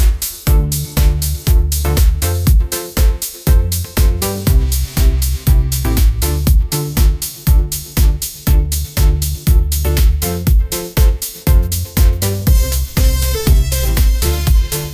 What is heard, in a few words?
upbeat pop melody; ai